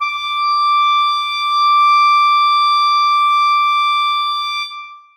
clarinets sus d6; Sonatina Symphonic Orchestra

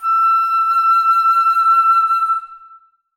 alto flute e6; Sonatina Symphonic Orchestra

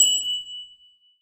glockenspiel f#5; Percussion; Sonatina Symphonic Orchestra

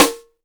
SNARE

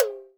TOM L; akai; mpc1000; factorypresetsamples

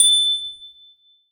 glockenspiel a5; Percussion; Sonatina Symphonic Orchestra

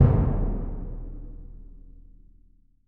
bass drum p; Percussion; Sonatina Symphonic Orchestra

